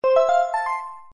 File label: system mail